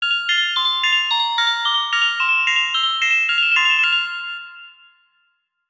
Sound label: Triangle Trample; Donkey Kong 64